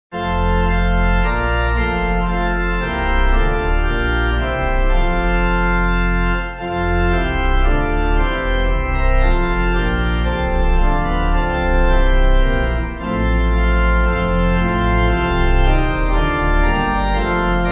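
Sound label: Pipe, Pipe Organ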